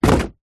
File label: foley cardboard box drop